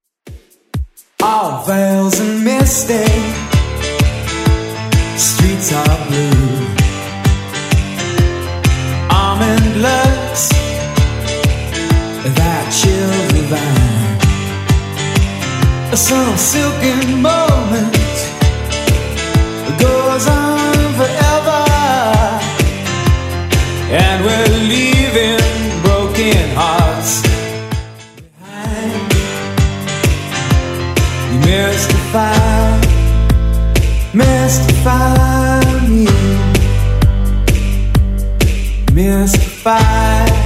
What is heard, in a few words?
80s Rock Redrum 129bpm